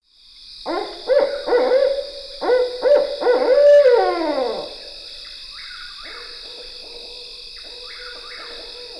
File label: Barred Owl